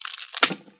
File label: bone break